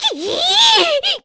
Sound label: Angry; Mila angry at Link; Zelda